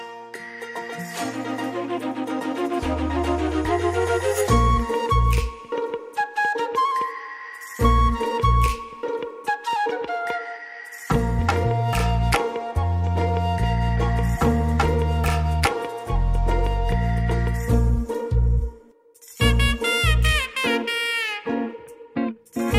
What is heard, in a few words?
Bgm, ringtone; Lubber Pandhu